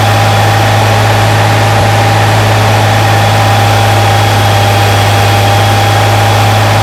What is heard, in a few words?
Stryker engine max; DCS